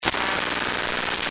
Scifi, Communication, Scramble, Glitch, Distortion; Science, Fiction; Communication Sound Effects